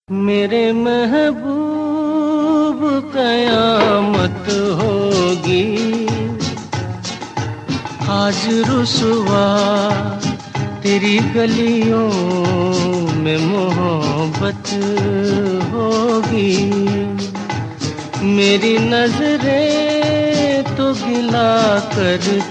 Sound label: classic bollywood